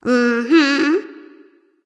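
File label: nonverbal agree; smite; ratatoskr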